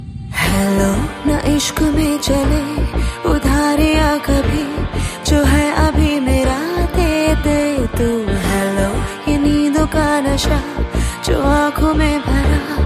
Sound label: Song Ringtone